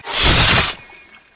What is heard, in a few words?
dash strike; LoL; yasuo base